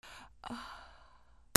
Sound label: PEOPLE